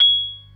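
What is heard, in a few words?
PERC C sharp 3; Best Service; Advanced Media Trax Modern Composer; B3 FULL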